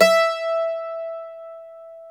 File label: MANDOLIN